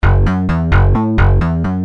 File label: Rage, Bass; Industrial